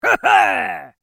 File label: Mobile game character victory shout; video game